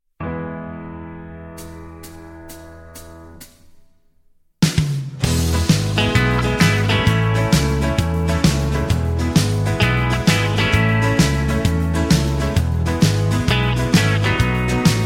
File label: (E) no backing vocals